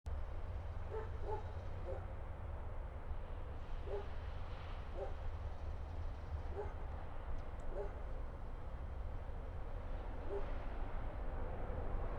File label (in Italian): Treno; Eigenmike; ComunePR; NuovaStradaF